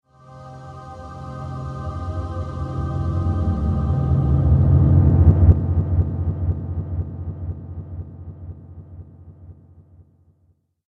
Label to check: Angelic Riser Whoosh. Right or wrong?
Right